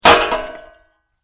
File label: Metal Pipe Impact Junk Crash, Metal hit with good rattle; Metallic object falls onto pile of metal